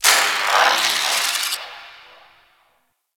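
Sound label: Distant Electricity; Warframe; Corpus Gas City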